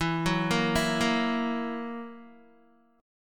E Suspended 2nd Flat 5th E down Guitar, Esus2b5 chord; arpeggiated; JGuitar